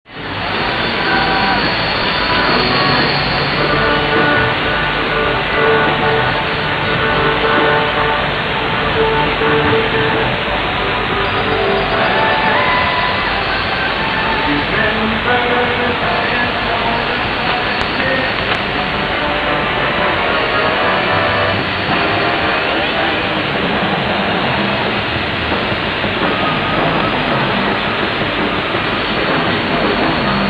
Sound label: Carillon